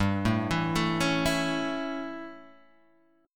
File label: A Major G; A/G Chord {3 0 2 2 2 0} arpeggiated